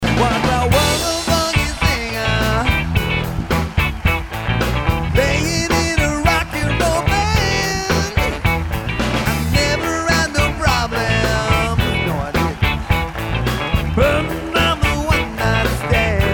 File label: Funky Music, Hawaii Dance Band